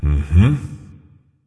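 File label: non verbal agree, voice; cernunnos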